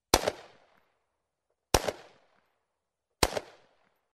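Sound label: sound of a pistol in the distance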